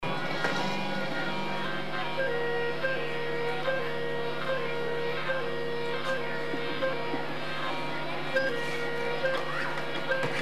osc rubbing noise; Spanish street recordings

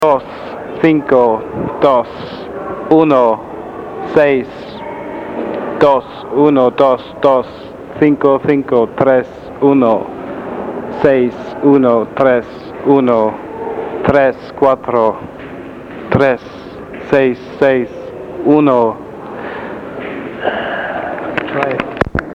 shrine dictorphone mexico, street recording

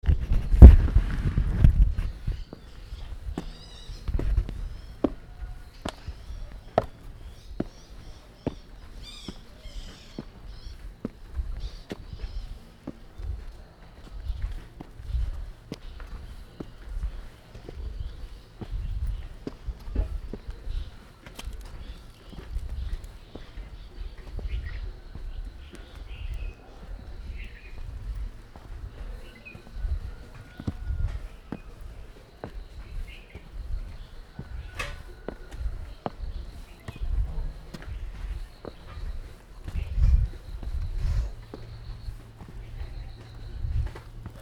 soundwalk insect ambience, Kathmandu; Kathmandu soundwalks at dawn

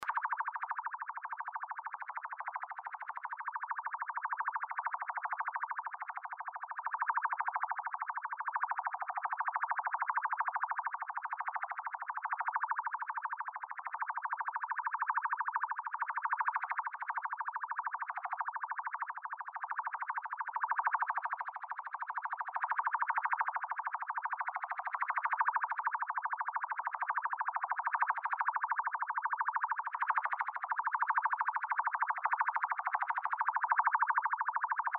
electric gamelan CICADA CYCLE

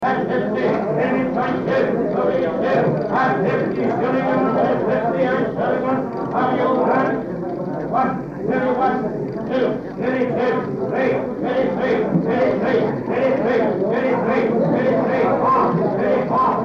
shrine sounds

